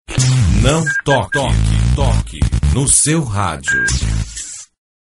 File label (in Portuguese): vinheta